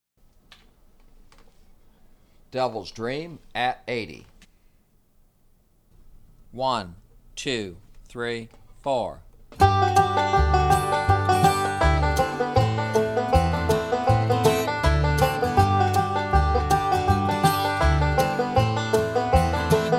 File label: Devils Dream @ 80 bpm